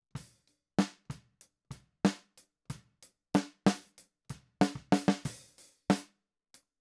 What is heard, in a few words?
Snare